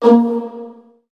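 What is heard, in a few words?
viola pizzicato A#2 right, Virtuoso Strings; ILIO; Kirk Hunter Virtuoso Strings VOL-2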